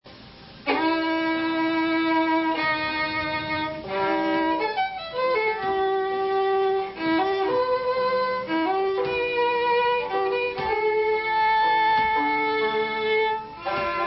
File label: Waltz